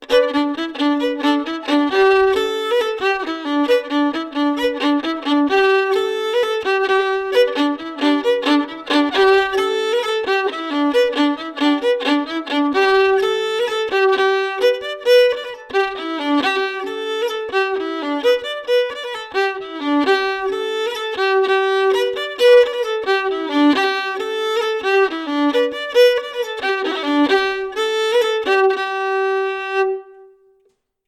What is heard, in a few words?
polka; boeuf trad